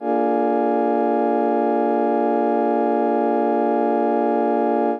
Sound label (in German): akkord b7 b1 tief